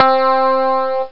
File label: strat, guitar; OctaMED SoundStudio V1; jazzies samples